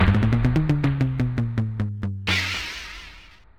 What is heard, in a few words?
Battle Failed; Spore Hero Arena; nds